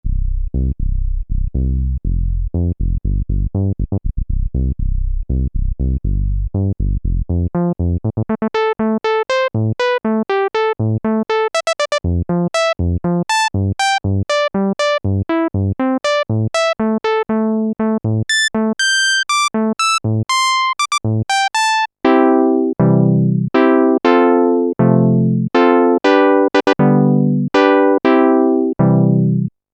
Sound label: alesis micron filter lpf 8pole lpf 8pole ser q000